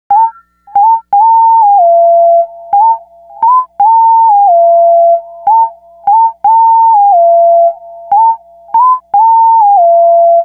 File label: Synth Lead